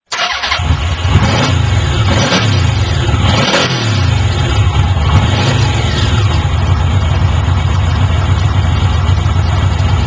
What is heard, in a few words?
v-max 1200cc; 着信音やアラーム（目覚まし）音に使える、着モーションとして; FOMA